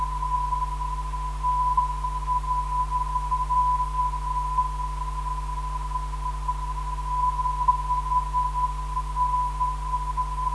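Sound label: THN 434kHz, non-directional beacon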